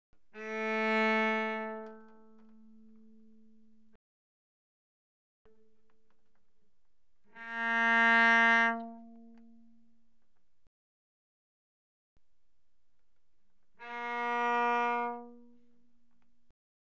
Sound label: Cello arco pp sul A A3B3; Strings